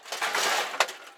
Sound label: Debris Junk Bump; blindside